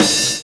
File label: FILL LOOP; Univers Sons